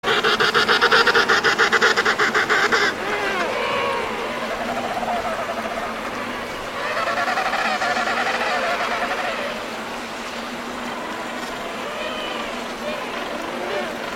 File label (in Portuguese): canto do pinguim